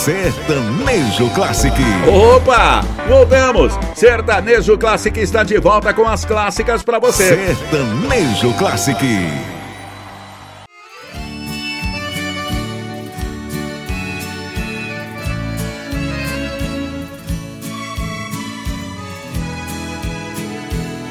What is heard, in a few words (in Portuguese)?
Sertanejo Classic